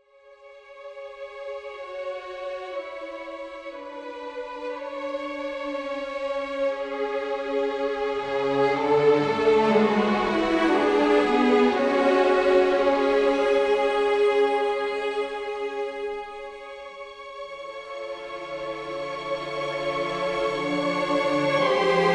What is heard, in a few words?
Soaring Strings; Musical Sampling